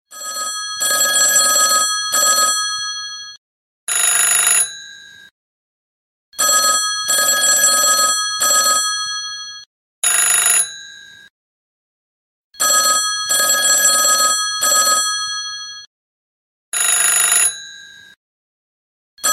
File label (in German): klassischer wecker ton